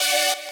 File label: saw